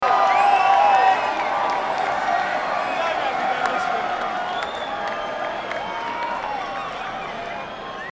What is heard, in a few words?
Crowd